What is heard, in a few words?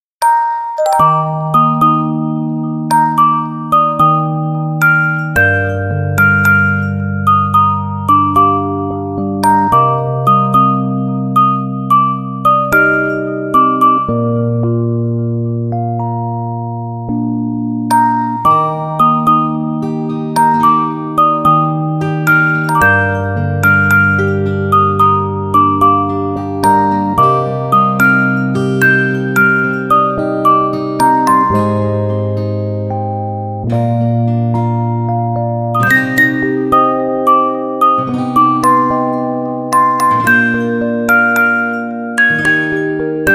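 Orgel, music box; supreme candy soundtrack cd; A Witch That Cannot Fly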